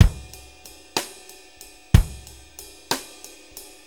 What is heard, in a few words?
6-8 Loop, Full Kit Loops